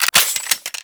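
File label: submachine gun Reload; Warframe; Tenno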